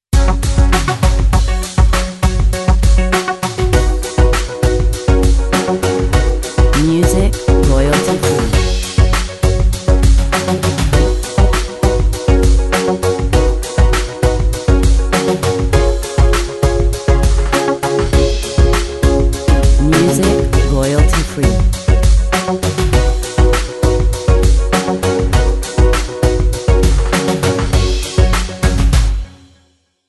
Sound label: Electronic